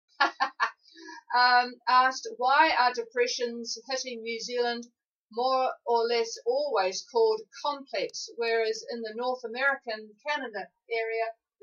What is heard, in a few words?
train